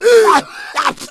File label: pain smoker; umbrella